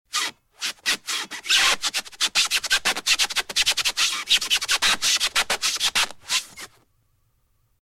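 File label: squeaks similar to scratches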